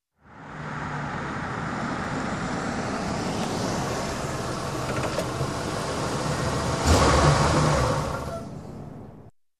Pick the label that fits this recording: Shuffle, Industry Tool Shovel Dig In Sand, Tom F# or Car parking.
Car parking